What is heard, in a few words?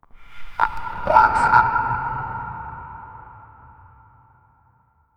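panic scream high pitched; sound effect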